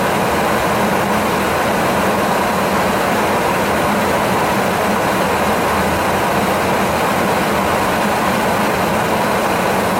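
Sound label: sound of a flying jet plane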